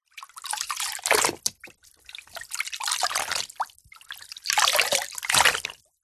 household cleaning cloth wet in and out gently of bucket of water